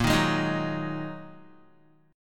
Bb 7th Suspended 4th Sharp 5th, Guitar; Bb7sus4#5; strummed; 6,6,6,8,6,x; JGuitar

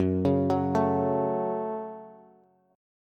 Gb Minor Gb Bass; arpeggiated; JGuitar

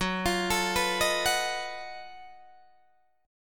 Gb Minor Major 13th Gb down Guitar DADGAD

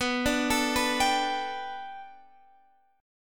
B Minor 7th Sharp 5th B Guitar Standard, chord; x,14,12,14,12,15; Bm7#5 arpeggiated; capo=3

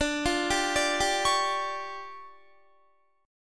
D Minor Major 7th Double Flat 5th D Guitar; DmM7bb5 arpeggiated; 21,20,19,19,19,21

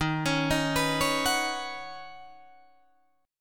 Eb Minor 7th Sharp 5th Eb Guitar Drop D

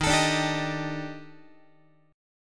Eb Major Sharp 11th Eb Guitar; EbM#11; strummed

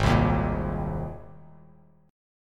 Ab Major Add 9th Ab Bass Standard; Abadd9 strummed; JGuitar

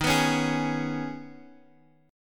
D sharp 7th Suspended 4th Sharp 5th; strummed; 23,26,23,25; D#7sus4#5; JGuitar

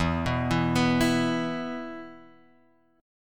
E 5th, Guitar, Drop D

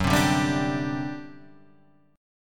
G Major 7th Suspended 4th F sharp

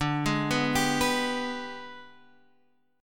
Ab Minor Major 7th Flat 5th

